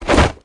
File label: catch; gregson